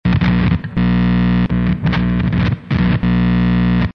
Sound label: guitar hum